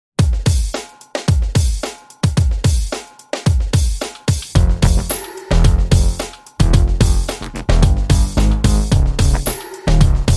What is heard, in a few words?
UNO Synth Gangsta; ik multimedia uno synth; سینتی سایزر و سکوئنسر iK Multimedia Uno Synth